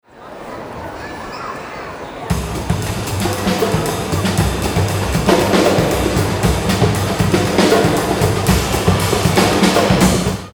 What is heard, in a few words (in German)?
klangsafari percussion grosser saal; familientag